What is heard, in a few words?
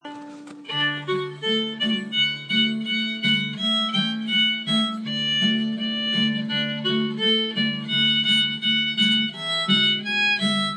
Polka